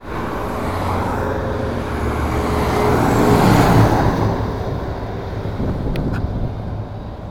Truck Passing

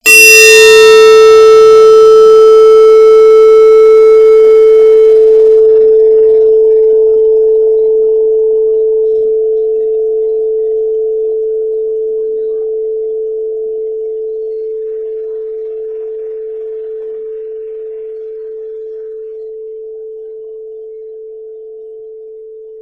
Sound Bell 440hz

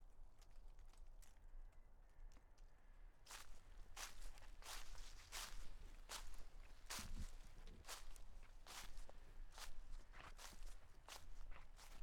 Footsteps In Leaves

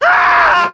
Scream

